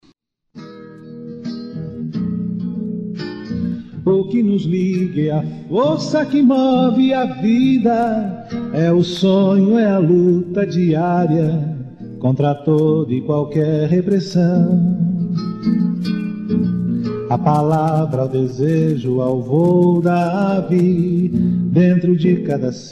viola

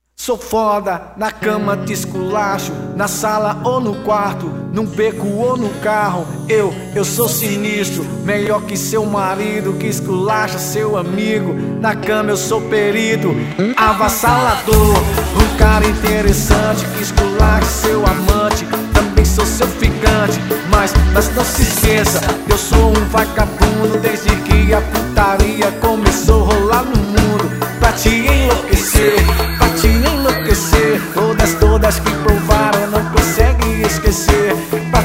samba ensaio; planeta you samba; ensaio de verao 2012

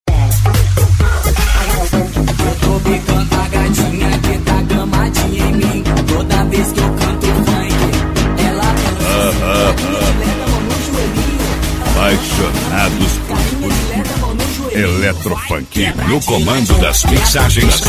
electro funk

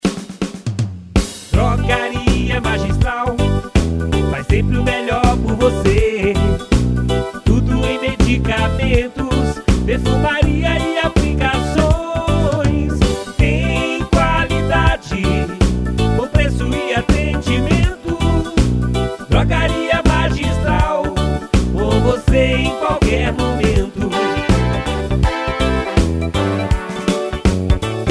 jingle politico; Drogaria Magistral

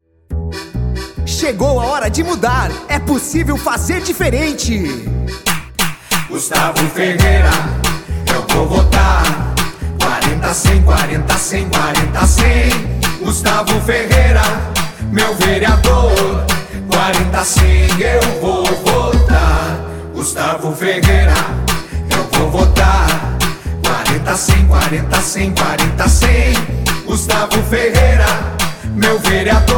jingles politicos, parodia; la casa de papel

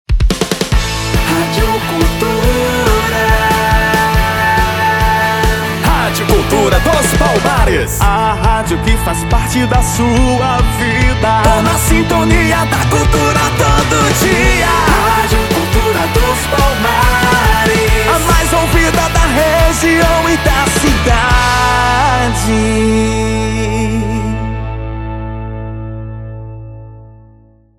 vinheta cantada radio

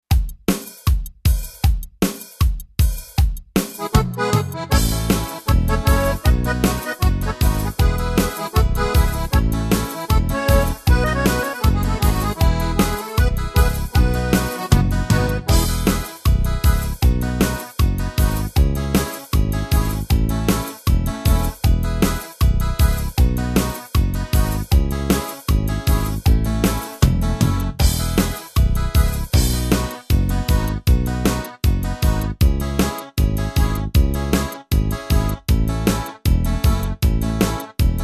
ritmos yamaha xote gaucho

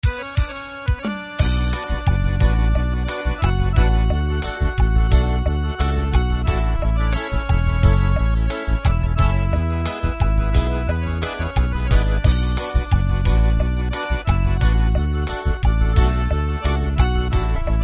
korg pa50 xote

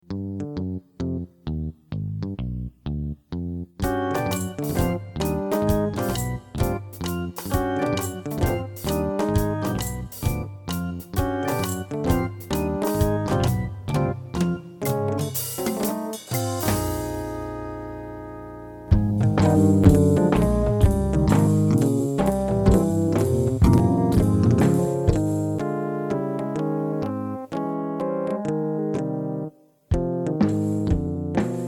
jazz arranhado

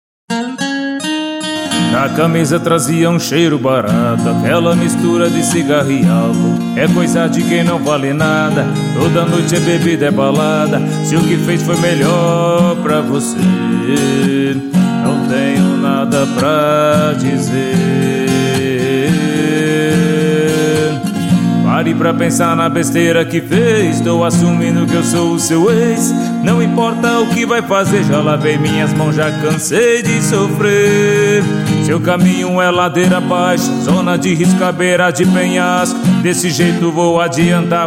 voz e violao

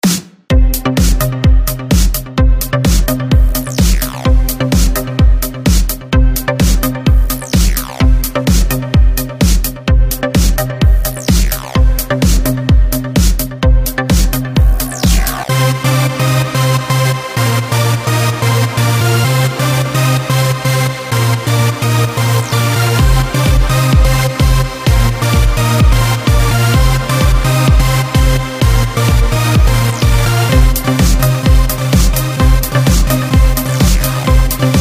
trilhas dance

